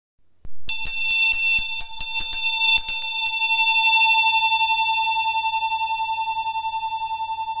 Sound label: Bell and Dorje 5 points sound; Dehradun